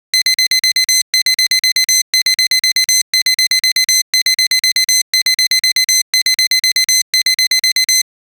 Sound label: calm classic alarm clock